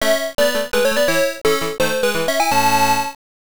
Game Complete; Slime-kun; arcade gamerip 1991

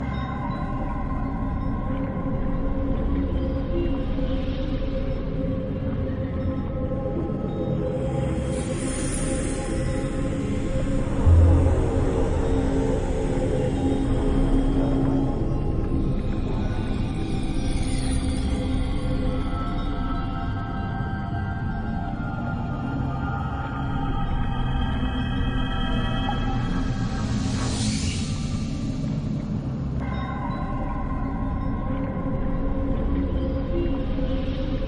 Ambient, Dark Caves; rayman 2

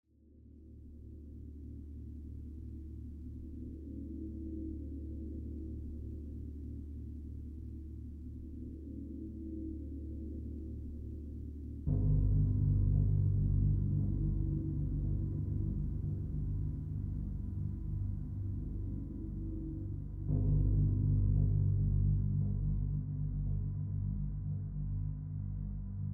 Drum and Harp; Drum and Harp Mood 6

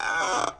Squeak

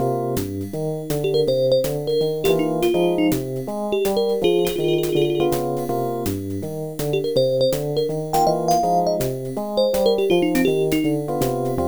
Bonus; zoop; ibm pc at

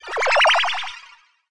Fld Recover; The Denpa Men; Android

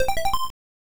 Pause SFX; Konami; super boy 3; msx

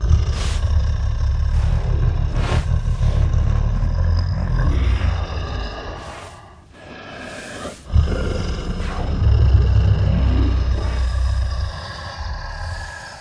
EyePod thrum; Undead Overlord

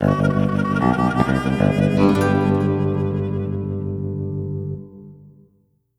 Music Stings; Rooftop

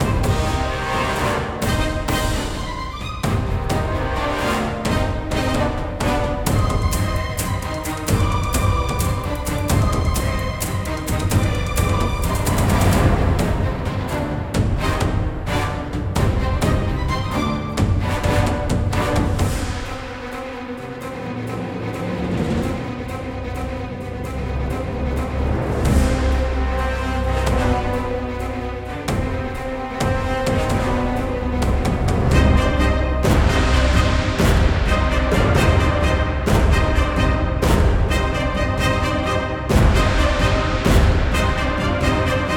Combat Loop

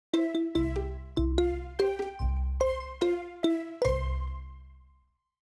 music intro; Koa and the Five Pirates of Mara

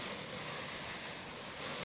thick plastic slide soft; a dump in the dark

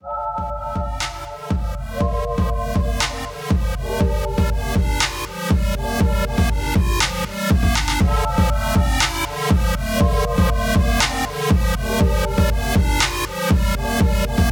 rhythm; lv(1) type2

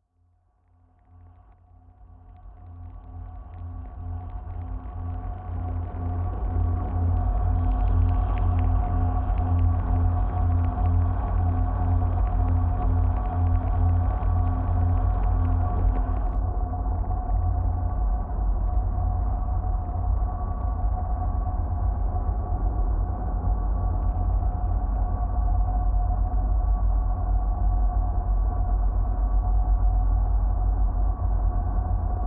Drone; Bignic Domina

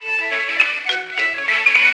Phone Ring

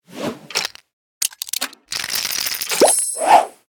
Gumballmachine; Candy Crush Soda

